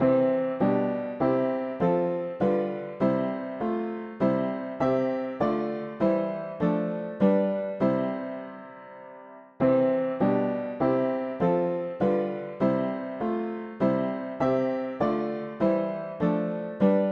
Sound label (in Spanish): mono piano; hymns; Himnario pequeno